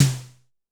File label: Drum X Snare; Pearl Drum-X